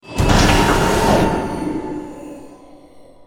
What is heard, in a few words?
Stinger Action Short